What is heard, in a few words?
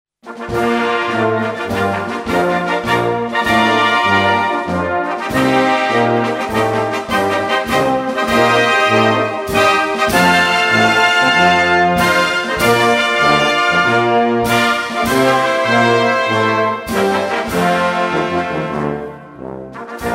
lions fanfare